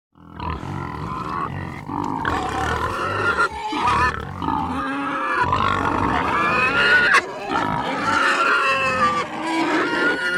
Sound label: Pigs Several Snortin